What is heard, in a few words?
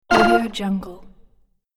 button game action menu